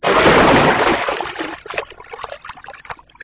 surfacing noisily

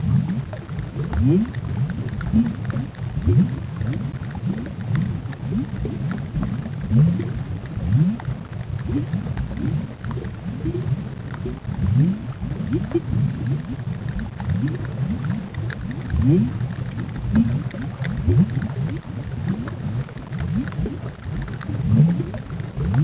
deep bubbles

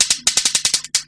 taser

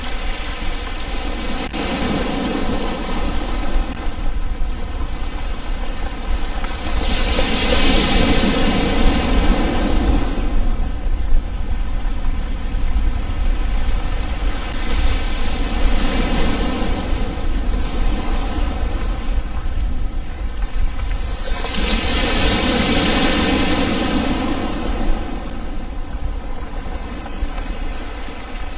sea on rocks

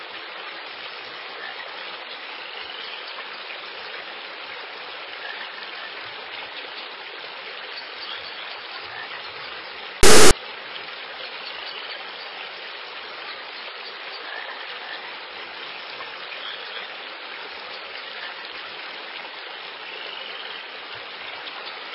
country steam

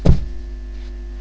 land

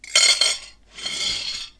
plate cutlery

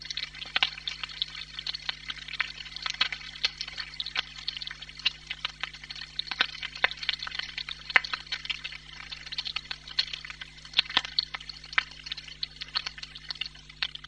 bacon sizzle

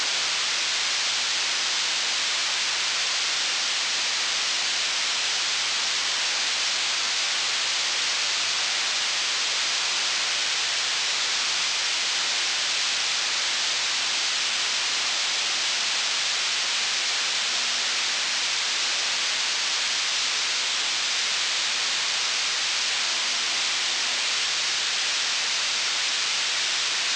static

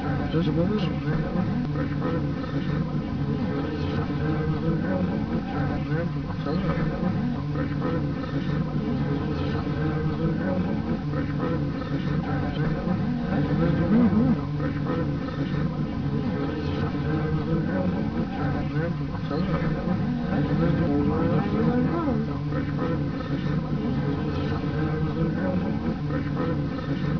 crowd mumble

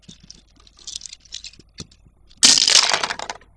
throw stones

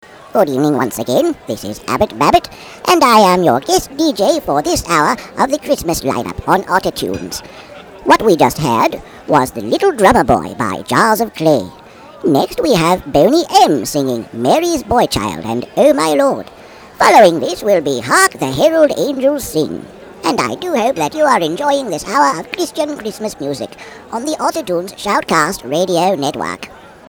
chritmas songs

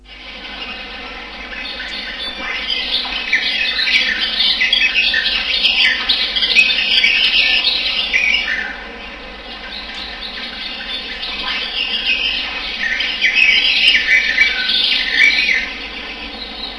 forest ambience

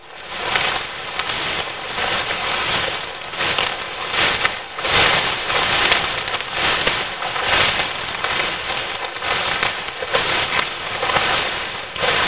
grass

